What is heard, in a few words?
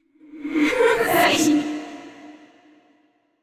laugh; yamiko